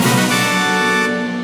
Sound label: Pinball score; Space Minigame